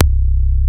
MOOG C1, ANALOG BASS; Music Store - Claudius Diemeter Schwerlos

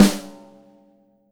KIT SD, Drums Acoustic; USB Soundscan vol.10; AKAI; 90s sample CD; ALL SD

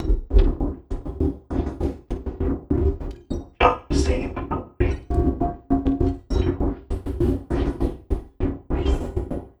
Envelope Beat; Beats; Sonic Foundry; Drums & Drones

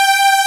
Synth Strings G4; Proteus 2000; Multisamples; Digital Sound Factory Proteus 2000

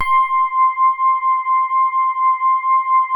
SWEETER C5, E-PIANO; Music Store - Claudius Diemeter Schwerlos

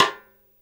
Jazz kit rim, Drums Acoustic; AKAI; 90s sample CD; USB Soundscan vol.10; JAZZKIT 3

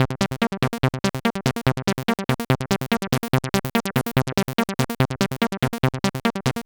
Synth, Prototechno; Sonic Foundry; Synths

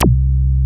MOOG HI C2, ANALOG BASS; Music Store - Claudius Diemeter Schwerlos

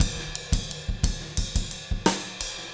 ride beat, drumscores

